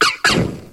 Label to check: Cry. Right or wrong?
Right